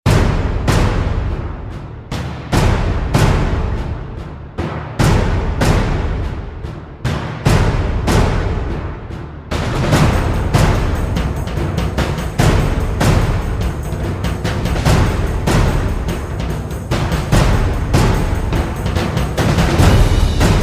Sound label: Drums Only Version